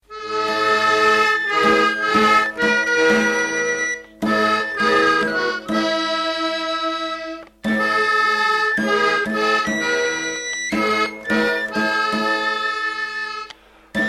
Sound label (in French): accordeon; au beth som de l'estivera